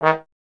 Trombone D#; Miroslav Vitous - Solo Instruments VOL-1

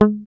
GUI E-Gui Muted; Sound & Vision; Gigapack I CD 2

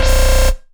Synths Root C

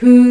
VOX WHO B3, Scats Choir; 90s Sample CDs; Club-50 - Foundations Roland; Short Scats

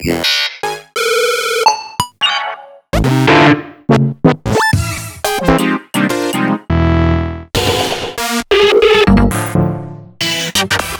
Synth Sounds

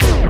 scratch; Hip Hop; E-MU Formula 4000 Series Vol. 1; Hip Hop Nation; Bonus Perk

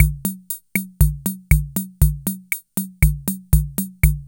BEGUINE; INIS - Funky Xtreams

